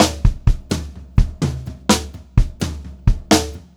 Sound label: 126 POP TOM, Drumloops; USB Soundscan vol.37; AKAI; Pop-Rock